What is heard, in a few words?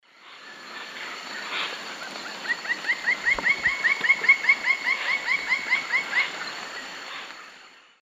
Dryocopus lineatus, call; S. America; Eastern Lowlands